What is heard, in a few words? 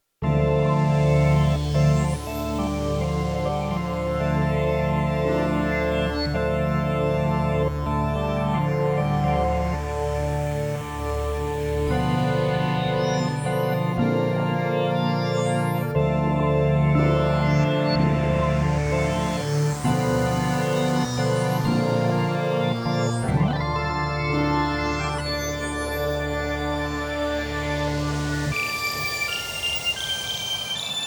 space prelude